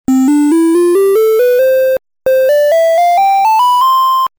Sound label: triangle